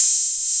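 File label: soft hi-hat open; DR.ACCESSOR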